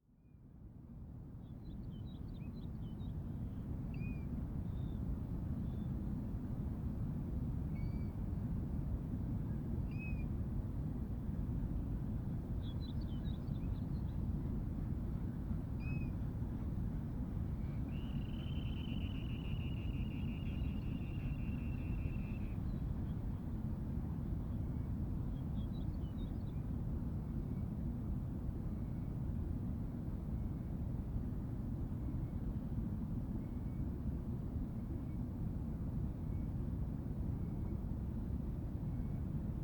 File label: Snow Bunting